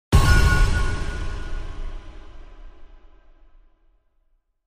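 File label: Impact Double High Metal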